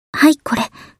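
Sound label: attack; hibiki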